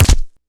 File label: sniper hit, bullets; weapons